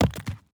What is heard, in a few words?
masonry hit; teardown ps5 windows xbox series xs gamerip 2022